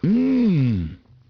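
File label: male yum; People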